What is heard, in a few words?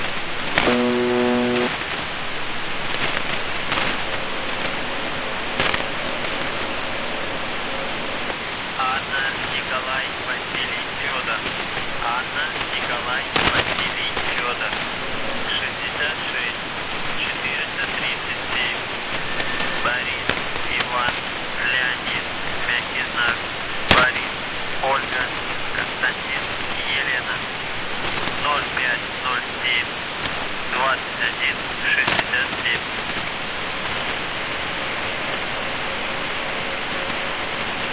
buzzer usb msg